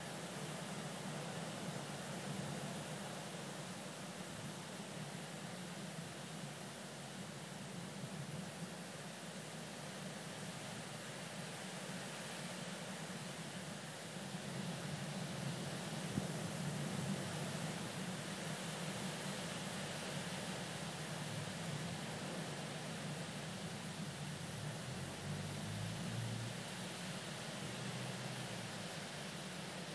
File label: church cloud burst sound of rain and blustery wind rustling leaves; Holme Hale